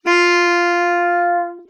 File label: Sax